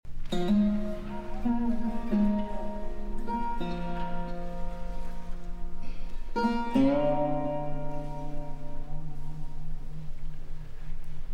Taqsim and Narration

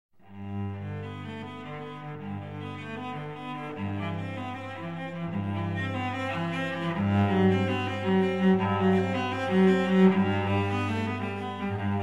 Suite For Cello Solo No.1 in G Prélude

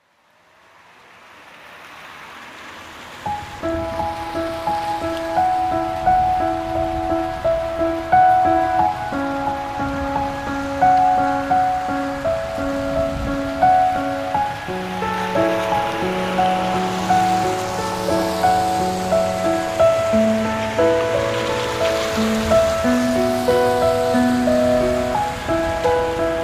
Piano and Rain

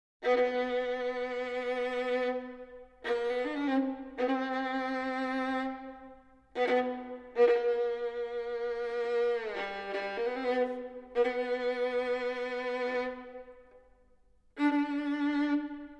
Tzigane, Version for Violin & Orchestra

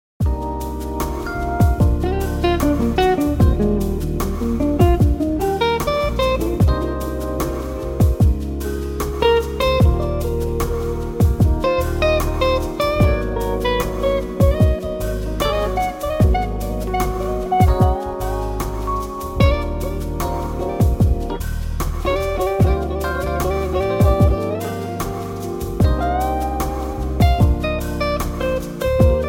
Jazz

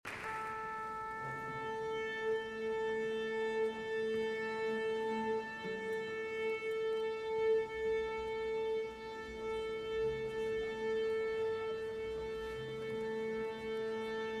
Piano Concerto in C Minor, Allegro (Live); Mozart; Piano Concerto No. 24

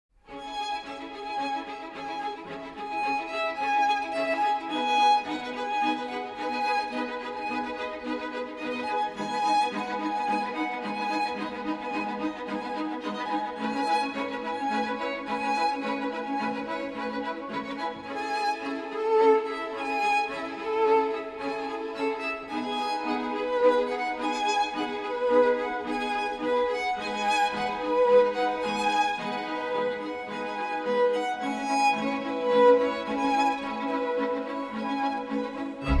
Solo Violin, Piano & Strings

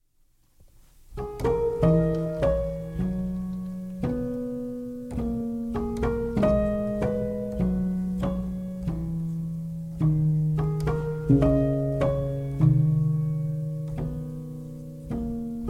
Piano Version

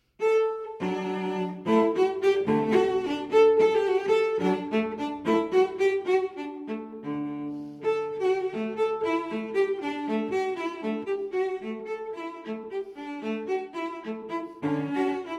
Cello Suite in D Major Gigue; Cello Suite No. 6 in D Major, BWV 1012; VI. Gigue

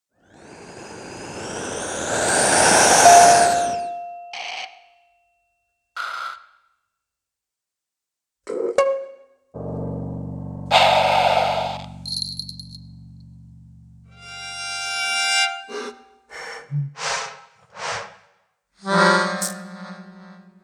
Study For One Cymbal Stroke; Male Instrumenty

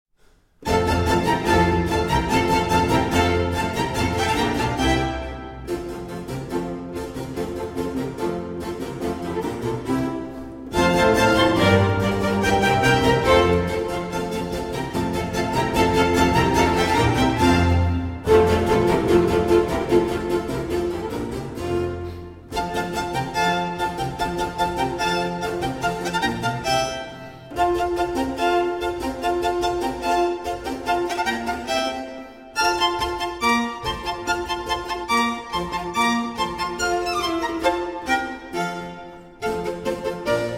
Violin Concerto in F Major Allegro

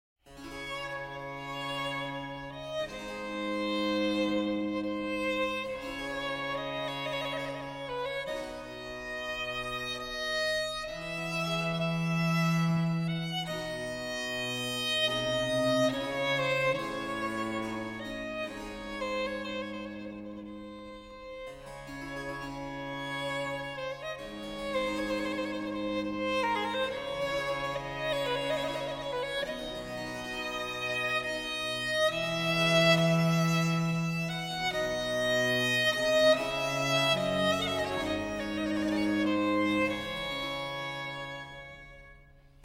Violin Sonata in D minor; Jefferson in Paris, 1995

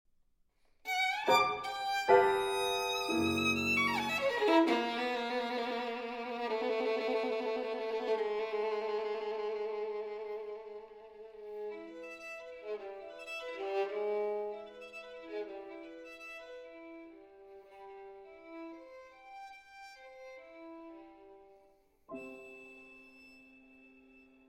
Sonata For Violin and Piano in G Minor, Intermede

